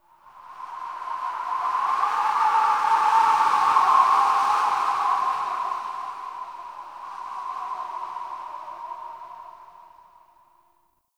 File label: Whistling Wind; Warframe; Tau